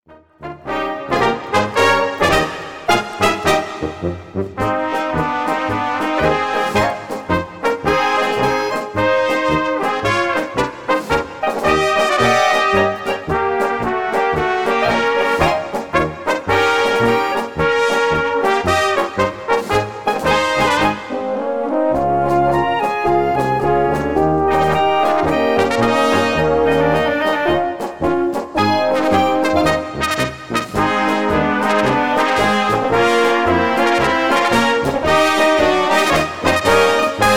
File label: Polka